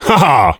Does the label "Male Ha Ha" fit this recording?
Yes